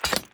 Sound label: weapon down